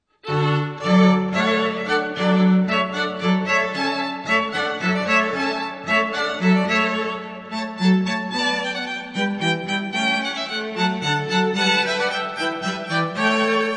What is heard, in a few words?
hornpipe, string quartet